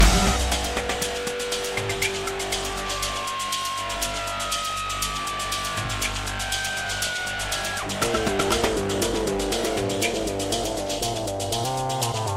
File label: Ambient Loop; True Crime New York City; Xbox; Shared BigDaddy Ambient Loop